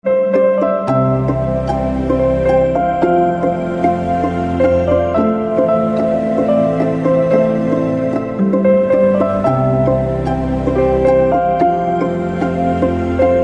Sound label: Piano Instrumental Ringtone